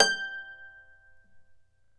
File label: Celtic Harp